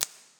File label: substation oneshot; nuke